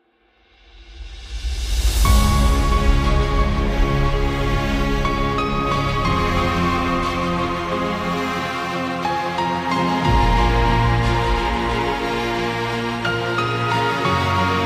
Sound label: Epic Triumph